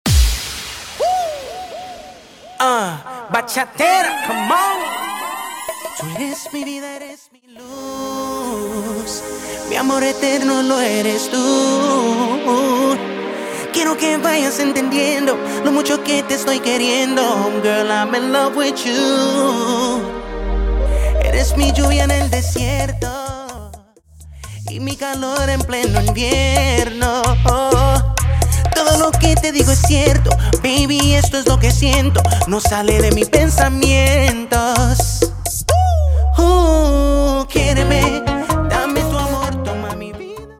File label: Open Show 128 bpm; Prodeejay Remix